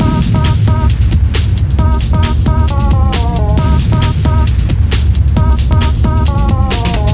Robot Loop; blender volume1.5 1995

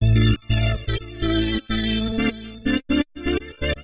ORGAN VOCAL 125 A; Keys

